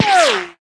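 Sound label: ricochet concrete, weapons; firearms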